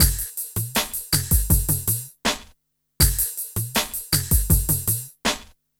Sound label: Smooth, R&B Drums